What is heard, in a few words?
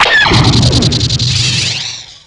cannon charge; tie